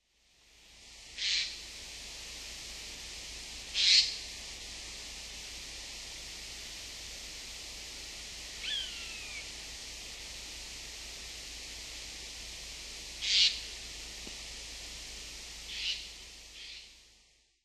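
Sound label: Eurasian jay, sado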